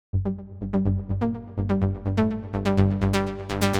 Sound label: Lead Melodic Techno Hive